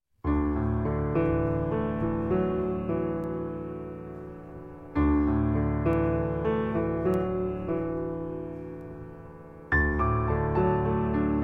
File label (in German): VaterUnser Instrumental